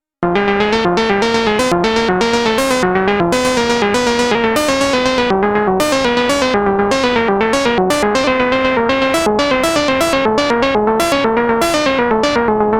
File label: Roland SH-101